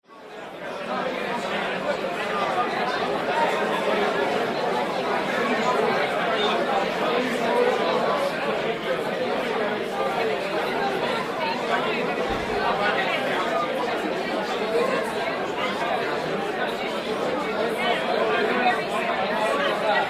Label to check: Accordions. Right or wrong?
Wrong